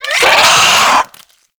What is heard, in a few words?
Infested Biped Pain; Warframe